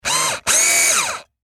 Industry Electric Drill Tighten Screw Whine Stress Twice; Drills; SFX Bible